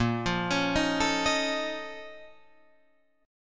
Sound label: A sharp Minor Major 11th, Guitar, Drop D